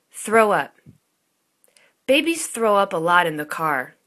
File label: throw up